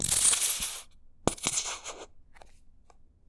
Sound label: Plop Viscous, Bottle Plops